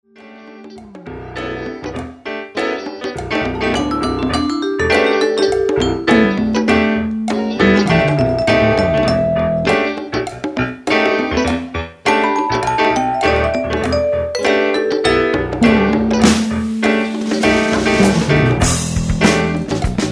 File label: Band Party